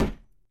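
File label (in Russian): слабый тихий удар молотком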